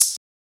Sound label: Shaker; FLPRO